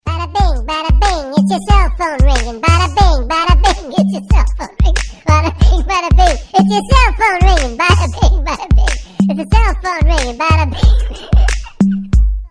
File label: Bada Bing Ring